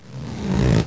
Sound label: slingshot stretch; cstrike; snowwars